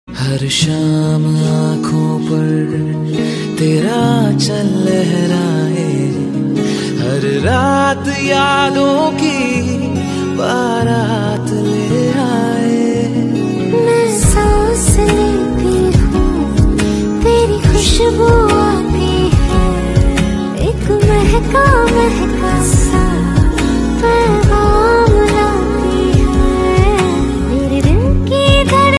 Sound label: romantic ringtone